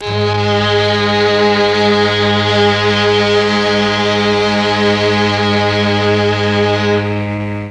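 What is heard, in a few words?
G#1 MIXED STRINGS, Mellotron; Mike Pinder - Mellotron Archive CD; MIXED STRGS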